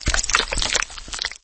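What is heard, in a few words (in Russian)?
выливается содержимое яйца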